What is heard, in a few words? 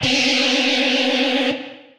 Guitar, Amped; Guitar and Bass; Ableton; Factory Packs